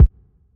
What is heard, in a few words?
large room bass drum sound b key